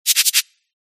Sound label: shuffle